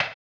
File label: HAMBONE, Vocal Blues Soul; Spectrasonics; Planet